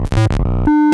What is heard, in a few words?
Raw Glitch